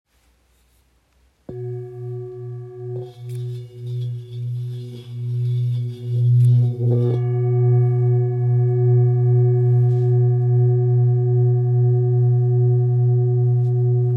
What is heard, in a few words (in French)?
La dièse grave, bol tibétain; coupe l'arbre; 2,440kg